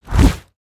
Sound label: Owl Wingflap; Valiant; Summoner; aronwk collection; ndaudio